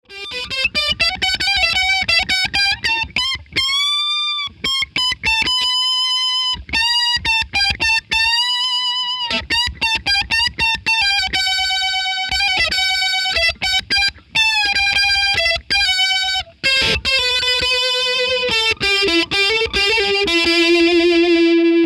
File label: Electric